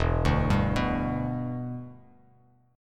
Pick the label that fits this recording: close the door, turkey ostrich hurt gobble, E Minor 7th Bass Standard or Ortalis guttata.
E Minor 7th Bass Standard